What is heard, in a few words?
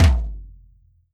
drum, WORLD DRUMS; World Instruments 2; PS MIXED DRUMS